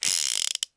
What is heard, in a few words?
spin